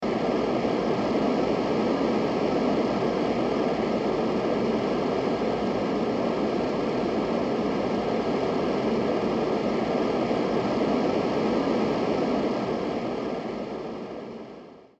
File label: whish fan